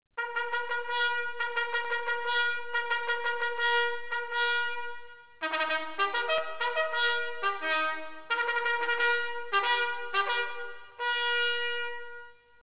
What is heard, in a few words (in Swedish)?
samling, signaler; dragoner